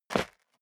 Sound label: step sneaker concrete grit single stair up; schritte